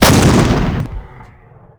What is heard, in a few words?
20mm fire; WAR